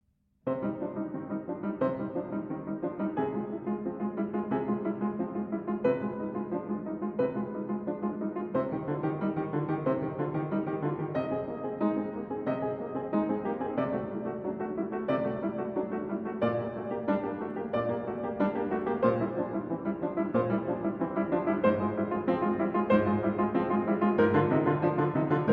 Prelude & Fugue in C Minor, BWV 847 I. Prelude

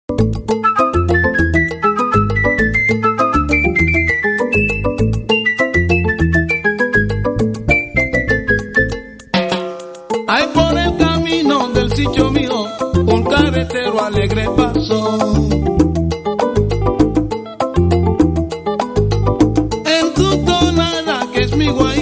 African Salsa

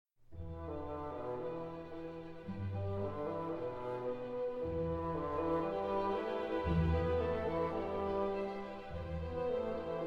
Symphony in E Minor, Allegro Ma Non Troppo

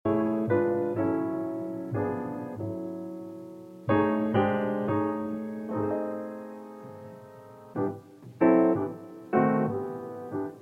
Jazz Piano Solo; Wicked

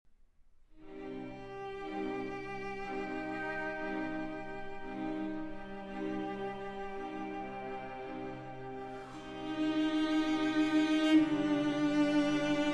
Elégie in C Minor; Fauré; Op. 24 (Arr. Parkin)

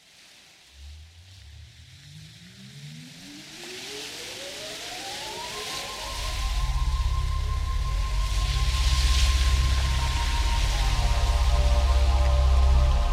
Instrumental Version

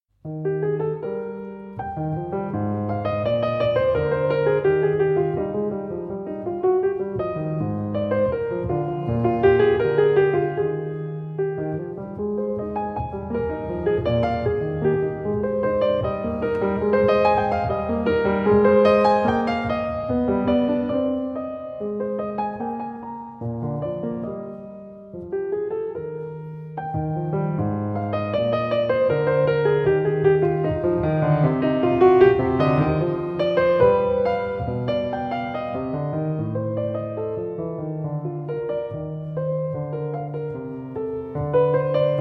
Piano Sonata in E-Flat Major, Moderato; Movement 1.

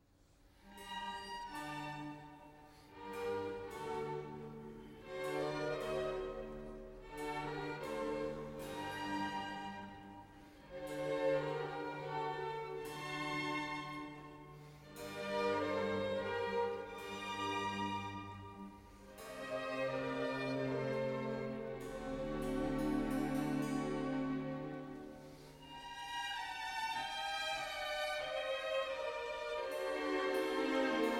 Violin Concerto in G Minor, Allegro non molto; Op. 8 Violin Concerto No. 2 in G Minor, RV 315, L'Estate. I. Allegro non molto

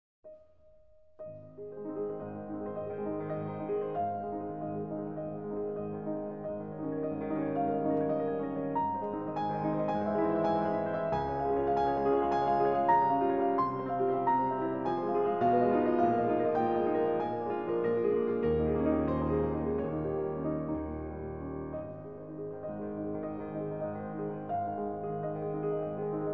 Études in A-Flat Major, Allegro Sostenuto; Op. 25 No. 1; Aeolian Harp

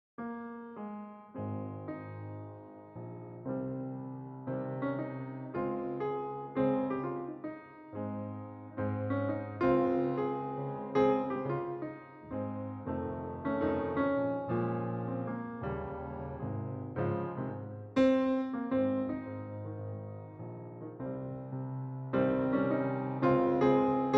Chilled Jazz, Cafe Bar

